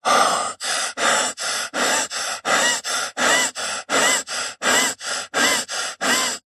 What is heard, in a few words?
male med fast breathing panting panic; zapsplat; human